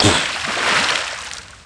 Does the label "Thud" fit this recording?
Yes